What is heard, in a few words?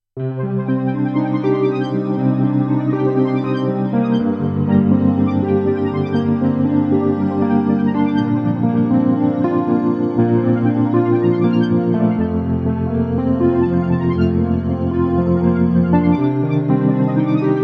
SOFT WURL